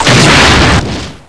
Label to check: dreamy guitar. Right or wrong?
Wrong